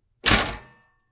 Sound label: Toaster, Toaster popping; Kitchen Sound Effects; Household